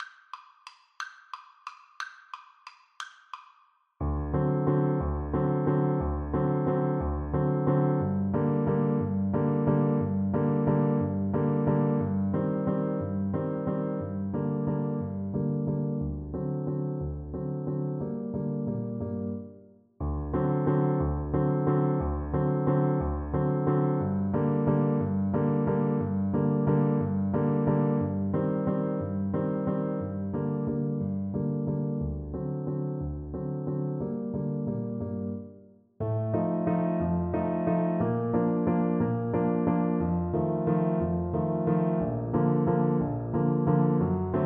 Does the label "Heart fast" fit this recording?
No